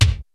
R8 ELECTRONIK

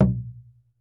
PUEBLO, American Natives, Earth Tones; E-MU Formula 4000 Series Vol. 4

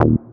Lo Bass; Zero-G; Soundclash Nu Dance Electro; Construction Kits; Feet First

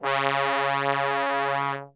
C#2 BASS TRB, Brass; Best Service; Brass Super Section

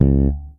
fret mute C#2, Pedulla bass; Masterbits CLIMAX 7 - Bass

